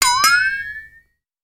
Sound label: flexitone